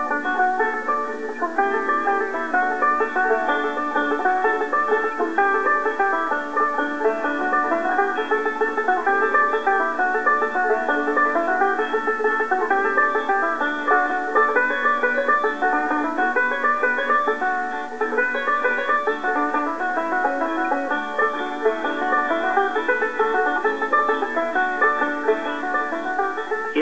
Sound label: fiddling